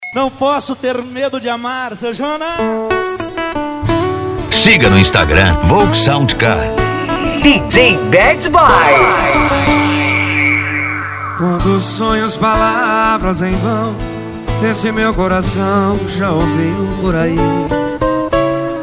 sertanejo e pisadinha; cd volks sound car

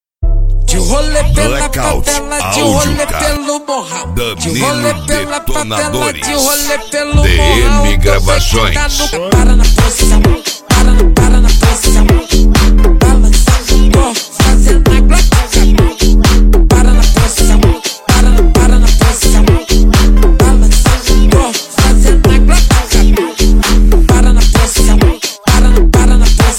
eletro funk

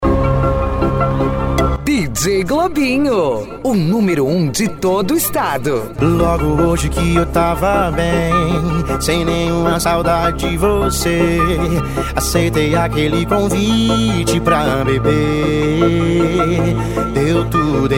esp funk pisadinha sertanejo

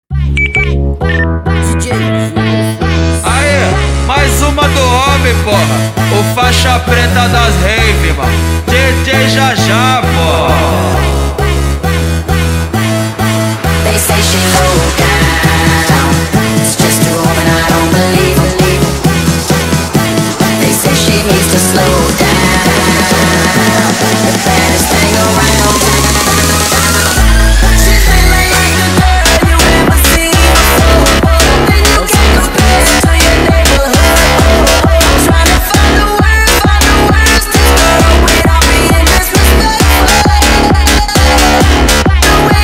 funk rave; projeto feste funkrave

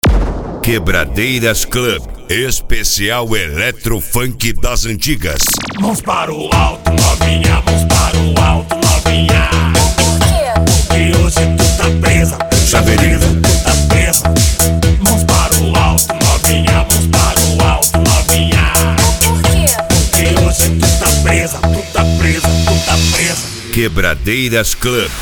eletro funk das antigas; quebradeiras club

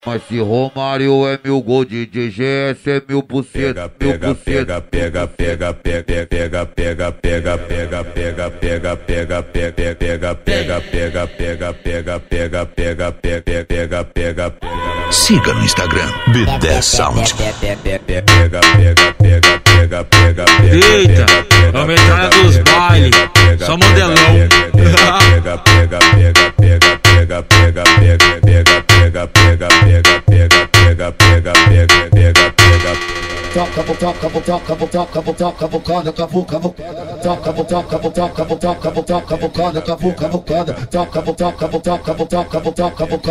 funk rave automotivo

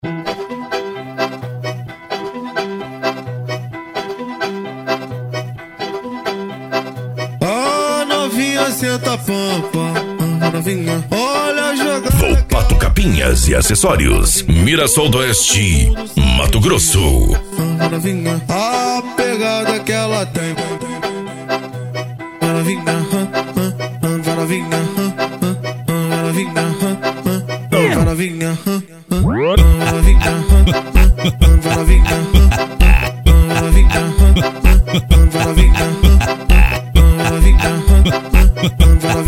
funk consciente e hip hop

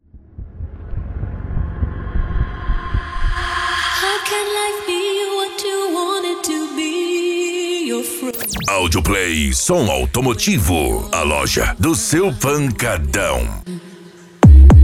esp funk mega bass

